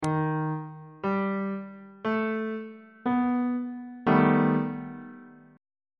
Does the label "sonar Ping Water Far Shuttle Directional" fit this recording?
No